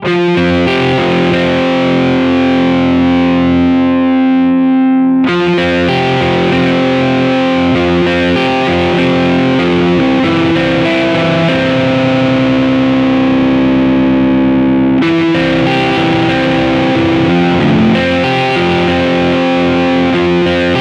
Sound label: Yamaha THR30 II Modern Lead